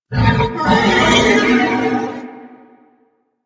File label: Horse Vocal Screech; Vehicles; Duviri